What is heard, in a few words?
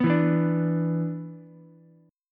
B-Minor Double Flat 5th, Ukulele; Bmbb5 strummed; JGuitar